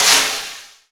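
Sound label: brush snare, GM drum set; AKAI S-Series CD-ROM Sound Library VOL-8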